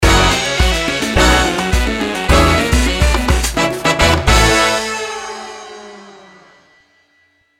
Undersea Game End; cobi treasure deluxe

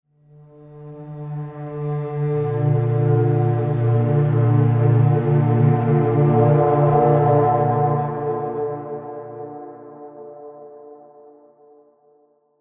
Tone Organ

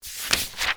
Book Turn Page; The Looker

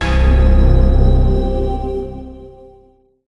Get Achievement; Spyglass Board Games

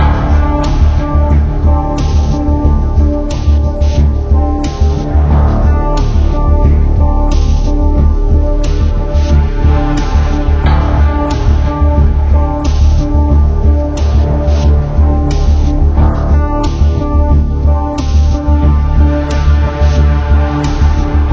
Tension Loop; Sun Down

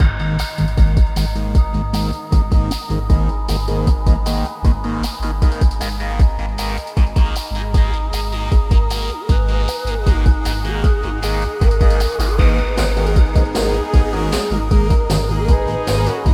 fight loop; The Swords of Ditto

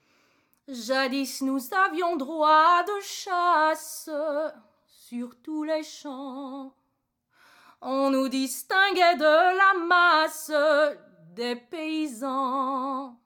Solo Women; assassin s creed unity gamerip 2014

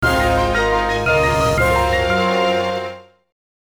Item Mystery; Pokemon Black and White

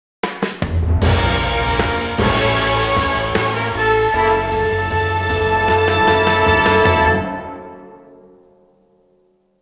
Groove With Intrigue Sting 154BPM 4-4; Evil Genius 2 World Domination; PS4; Xbox Series X/S

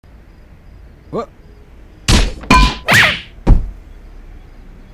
Neck Snap; Stealing The Diamond

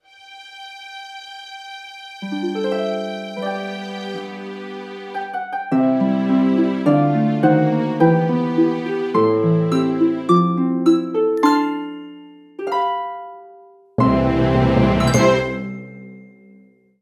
BGM Last Boss Harp; Super Mario Maker; LAST BOSS2

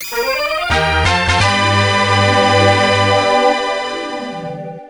Event Item Getting Jingle; Valkyrie no Densetsu; Namco System 2; arcade

